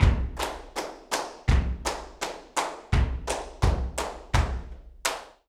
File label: Flamenco, Tapping, Farruca, 168